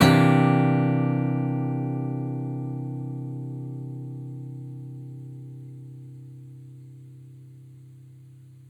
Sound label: Jazzy Three (open), Guitars; Total Drum&Bass